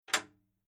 Household Old Farm House Door Unlatched Metal Latch